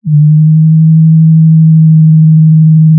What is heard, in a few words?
pop, Sound of a cruise ship horn